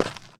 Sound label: dirt; firefight